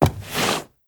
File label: Soft Wood Turn